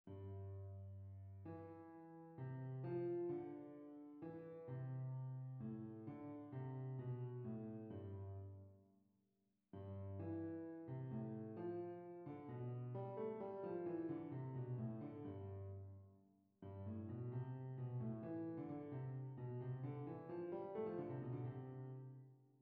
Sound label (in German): Fughe